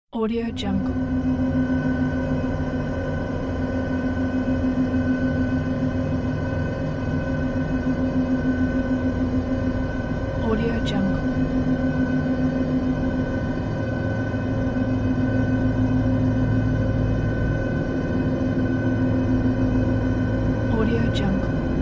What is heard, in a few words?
engine room